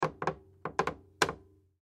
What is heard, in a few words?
Creak Wood Move; Creak Slow; Creaking (Sound Effects:Objects); Blastwave; Foley